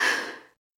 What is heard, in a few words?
Breath; Lewd SFX